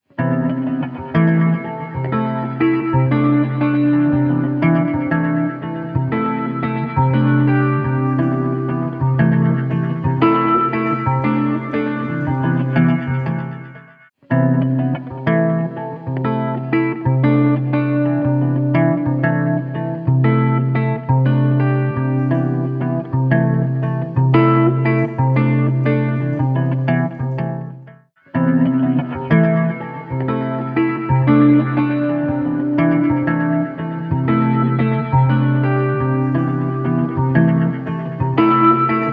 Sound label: Harmonizer Guitar Dual Echo Flanger, Electric Guitar; H949 Harmonizer; Preset: Echo Flanger (Dual)